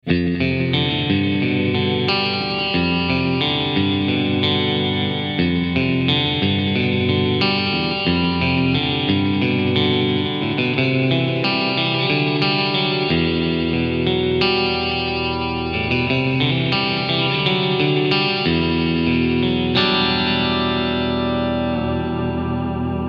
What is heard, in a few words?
Clean Repeats; Strymon Compadre → Strymon Iridium → Strymon DIG